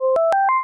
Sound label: Goal